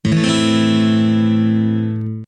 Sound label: a min x02210, guitar chord